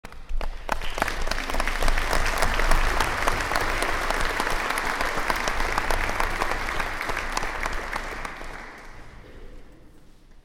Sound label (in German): Orchester Applaus